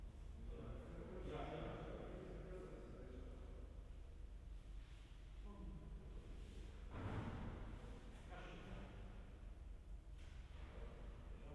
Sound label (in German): Kirche; Koper; Lexikon-Roman